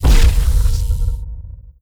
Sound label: stellarite attack plasma; Stellaris; guardians